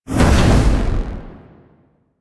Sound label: Void Dragon Boost; Enemies; Warframe; Duviri